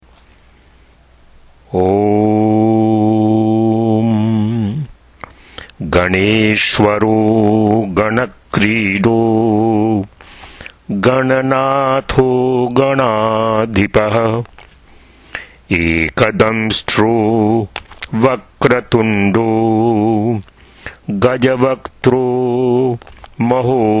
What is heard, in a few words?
chant only, sanskrit; Gaṇakrīḍaḥ, Gaṇanāthaḥ, Gaṇādhipaḥ, Ekadaṁṣṭraḥ, Vakratuṇḍaḥ, Gajavaktraḥ, Mahodaraḥ; The Gaṇeśa Sahasranāma presents 1,000 names of Lord Gaṇeśa